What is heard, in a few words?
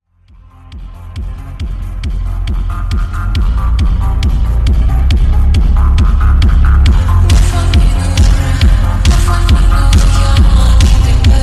slowed reverb bass boosted